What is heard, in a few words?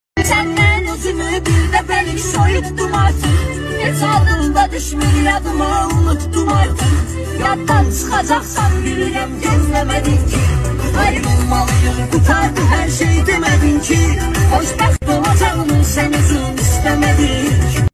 Remix